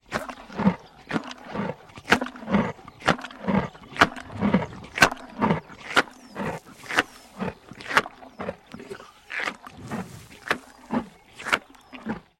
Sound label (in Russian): zvuki nosoroga